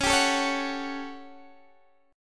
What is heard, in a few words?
A 13th Db, guitar chord